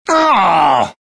ability fail; csgo; wcs go; snip

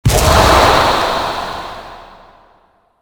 death coil; csgo; wcs go

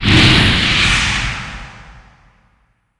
living bomb

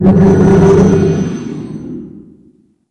hymn

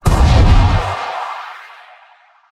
eclipse impact hit; luna

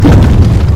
lava surge; csgo; wcs go

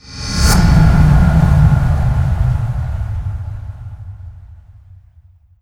power up

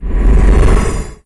light well

